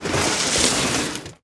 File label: Impact Plastic Tub Dragging Over Cement; Impacts